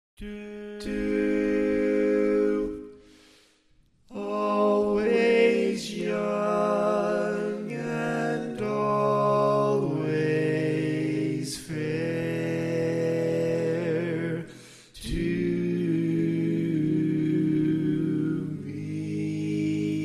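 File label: Baritone, Barbershop Tags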